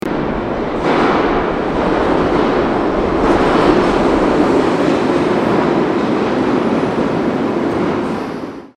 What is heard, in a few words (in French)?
Ambiance metro; bruitage